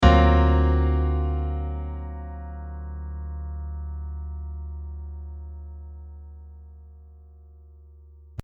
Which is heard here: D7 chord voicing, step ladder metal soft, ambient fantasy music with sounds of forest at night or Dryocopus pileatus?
D7 chord voicing